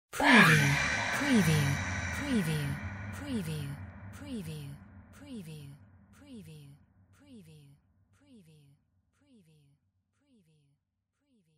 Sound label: sci fi whispers spooky eyee; windy beach audio